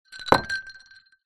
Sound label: food drink glass ice liquid down table